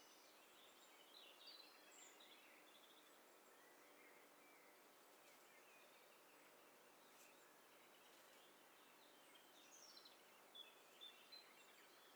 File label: STEREO BIRD COCKTAIL PARTY